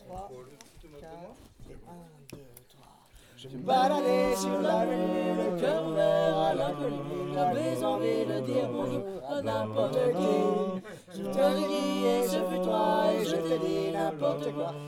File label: concert